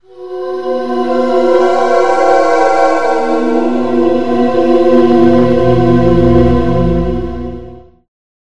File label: Voices In The Mist, Choir; Magnus Choir; Syntheway